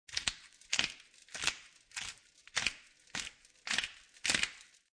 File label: Pepper Grinder Grinding, Household, Pepper Grinder, Grinding Coarse Black Pepper; Kitchen